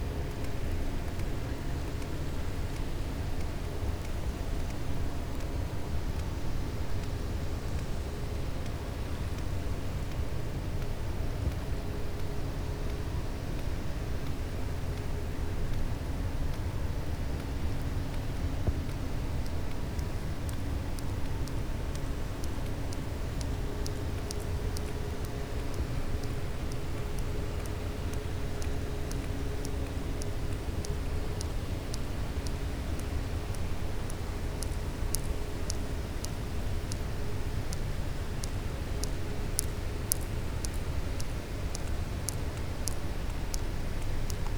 cachalot positif, Physeter Macrocephalus

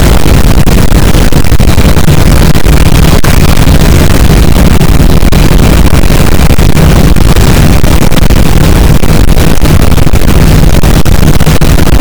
cachalot positif, boat; Physeter Macrocephalus

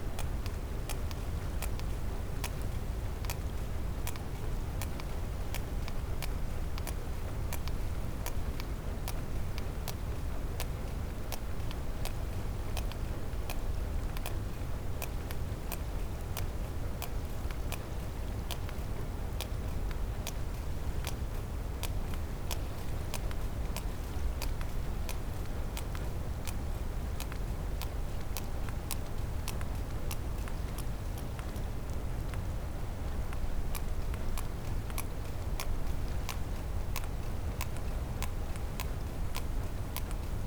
cachalot positif, Physeter Macrocephalus

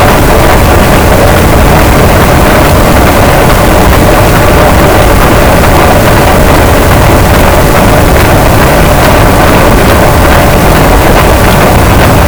cachalot, Physeter Macrocephalus, gaussian noise

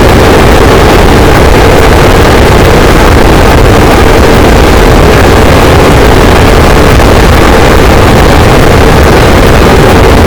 cachalot negatif, boat, Physeter Macrocephalus

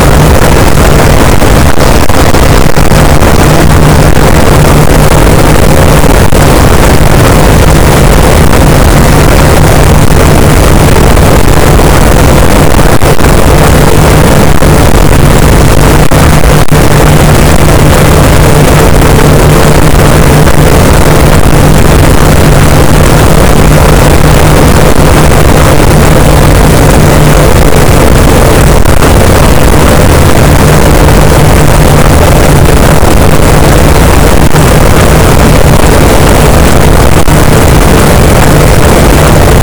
cachalot negatif, boat, Physeter Macrocephalus

